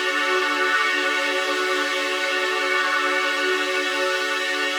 Pad Loop D, shimmer and sparkle; Pad Loops

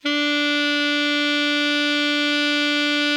ALTO MF D 3, Saxophones; Greytsounds; Philip Wolfe David Crozier's Saxophones

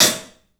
HAT, CLUBHAT, 3D Drums; 3D Sound

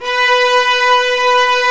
STR, Vlas Sect Agitato; Roland L-CD702; Vlas Sect1-2

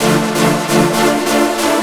Trance Synth

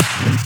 Claps; Vengeance; Essential Clubsounds Vol.4

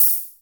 dry hihats; Quasimidi Rave-o-lution 309

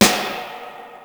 MPC 60

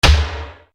HARD TOM, Yamaha RY-30

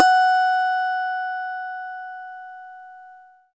GUIT Harm; Best Service Gigapack 2

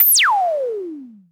Dual-Percussion-Synthesiser; Klone

